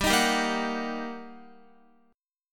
G Minor Major 7th Sharp 5th, down, Guitar; GmM7#5; strummed

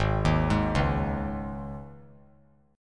G sharp Suspended 4th G sharp; G#sus4 arpeggiated; JGuitar

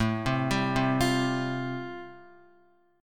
A-Minor 7th Sharp 5th, Guitar, chord; Am7#5 arpeggiated; JGuitar

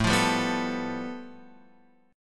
A 7th Suspended 2nd Sharp 5th A, Guitar Open F; strummed

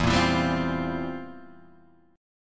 C 11th E

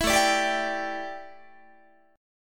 A 7th Suspended 4th, A, down, Guitar

